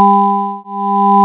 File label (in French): scie